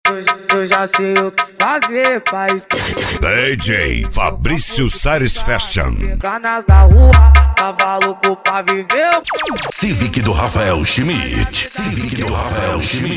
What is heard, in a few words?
funk mega bass